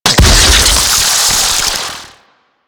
Fluid Sac Throw Impact; Ambience; Warframe; Infested Eris; Encounters

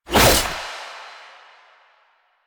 Skana Whoosh; Melee; 1999

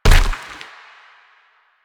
Runner Step Default; Enemies; Warframe; Proto Infested

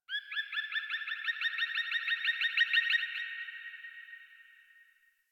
Birds High, Ambience, Plains; One Offs; Warframe; Eidolon Plains; Eidolon Landscape

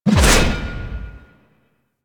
Melee Heavy Attack Large Whoosh; Warframe; Weapons; Whooshes; Heavy Attacks

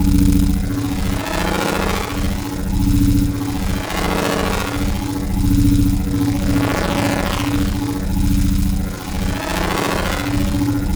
Interrupter Shield Loop; Warframe; Corpus

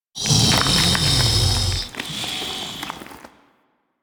Venor Breath; Warframe; Infested Eris; Ambience

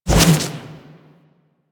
Melee Jump Slam Charge, weapons melee whooshes; Warframe

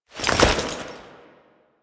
Foley Weapon Swap; Warframe; Kahl

